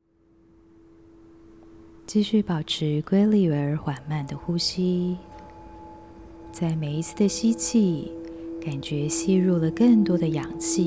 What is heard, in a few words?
energy sound spring